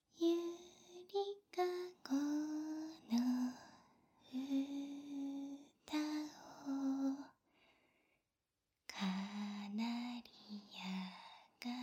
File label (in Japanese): 子守唄